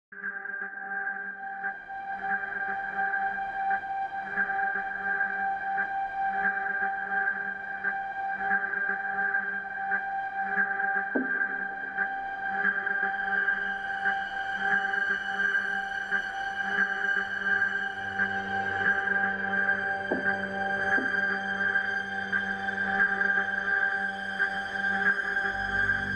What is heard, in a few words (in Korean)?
Tension 공포